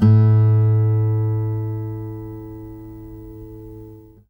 ACSMEDPICK, Guitar; Quantum Leap; Guitar & Bass

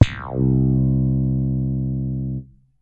Slap, Synths BASS

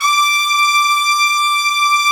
D6 TRUMPET; Northstar - New Gold VOL-1; ST.TRMPTS

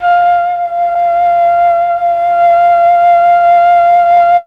flute; ILIO; Propeller Island Legendary M400

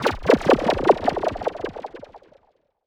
Hunched Bubblez

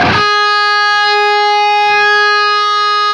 LEAD G#3, Guitar; East West; Steve Stevens Guitar Samples Collection

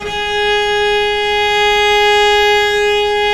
VL G#3, VIOLE, Historical Instruments; USB Soundscan vol.23

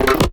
too fast, signals; AKAI; FX SIGNALS

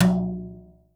BRSH; R70 KIT; Crypton - DrumBase5000; R70 KIT #1

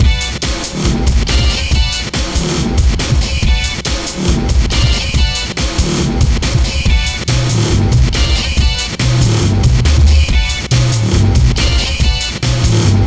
Hardcore Breakbeat, 140 Bmin; Big Fish Audio; Hardcore Breakbeat Action; Arena Rockin

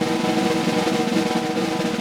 Snare Drum, Vintage; Q Up Arts; Johnny.C's Vintage Snare Drum; 6.5X14 LUD

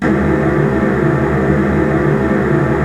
STR WHL TRLL, Virtuoso Strings; ILIO; Kirk Hunter Virtuoso Strings VOL-3

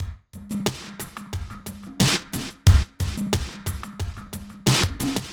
Gated Beat (90BPM); musicradar

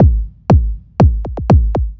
Kick, Trance

